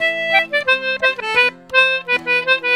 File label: C2 L, Celtic Flavours; USB Soundscan vol.42; AKAI